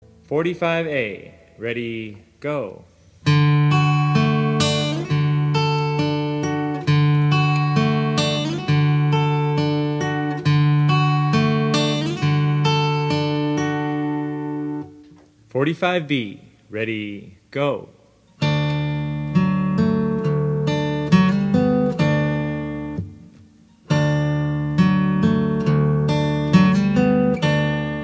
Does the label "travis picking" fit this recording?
Yes